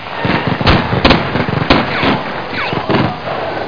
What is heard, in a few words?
melee